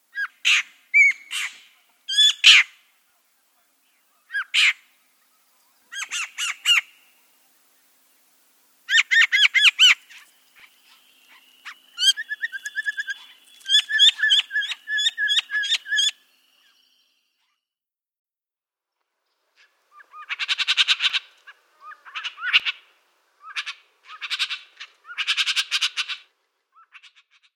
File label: Gray Jay